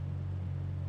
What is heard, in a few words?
idle, vehicles; reventon; TDMCarpack; TDMCars